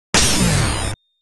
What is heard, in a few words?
Shock Gun; ショックガン; elle unofficial soundtrack; pc-98 gamerip 1991